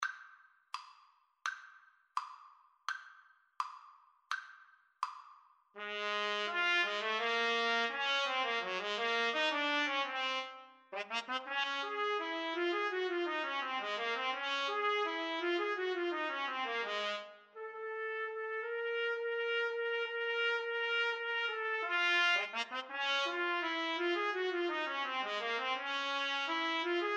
trumpet duet

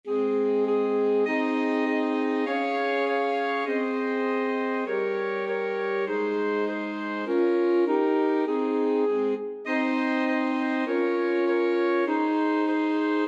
twinkle twinkle, alto sax quartet

